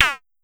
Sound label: Pollard Tom Hi, Syndrum